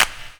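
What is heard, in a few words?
clap hip hop, drum kit; DRMKIT; E-Lab; X Static Goldmine 2; akai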